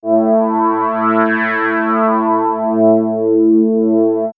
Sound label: Swirls Ambient Drone, pads; X-Static Goldmine